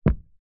foley footstep single trainer wood floor gentle soft